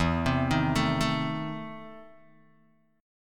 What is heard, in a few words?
E Major 7th Suspended 4th Sharp 5th, Guitar, Open D